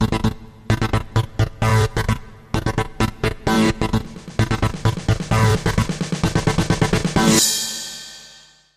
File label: Housearping